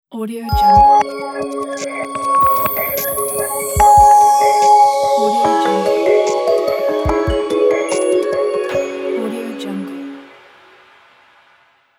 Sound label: crystal abstract logo